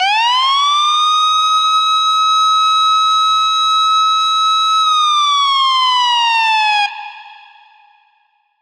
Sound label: FX Police; Riddim; One-Shots; SFX; Ghosthack; Riddim Essentials Volume 2